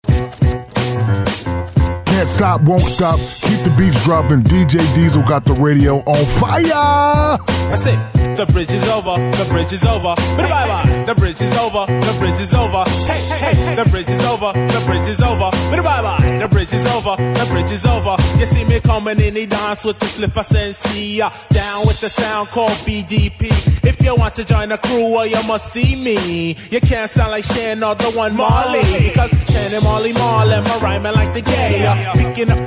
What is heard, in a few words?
80s mix